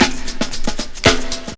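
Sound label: brk, break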